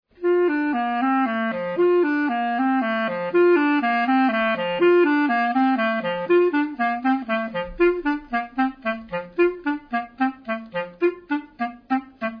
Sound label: leg stacc, clarinets